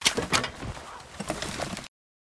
Boxout, weapons

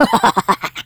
skelly; skeleton

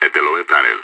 lower tunnel; IDF

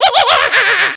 laugh, spooky pumpkin

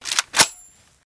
g3sg1 bolt, weapons

